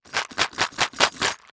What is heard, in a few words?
Concrete Pieces Rocks Metal Clamps Plastic Container Holes Rattles; Foley